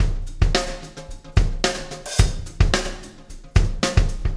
Beat, Loops

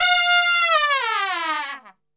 F 4, Brass Super Section, TRP; TRP LNGFLL; Best Service

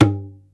tabla baya, Yamaha Motif ES drum machine; 300 Drum Machines; Yamaha Motif ES-Series

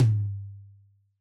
Yamaha DJX 2B, Jazz Tom

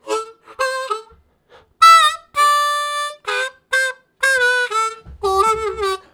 Slower, Harmonica Riffs; Zero G - Harmonica

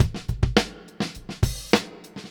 Beat Loops, 104; Zero-G; Monster Beats

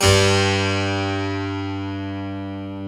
Harpsichord; E-MU Producer Series Vol. 2 – More Studio Essentials; Keyboards

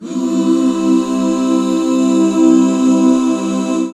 HAL C, Back Chords; Sound & Vision - Gigapack II CD 1

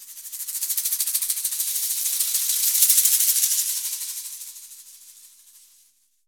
AMB SHAK, AMB PERCS; Univers Sons; Basicussions

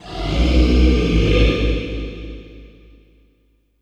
LION BREAT, Human; Distored Reality

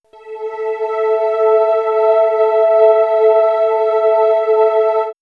Swirls, pads; RedLite; X-Static Goldmine

